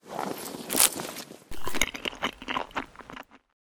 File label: snickers use; STALKER; IWP; item usage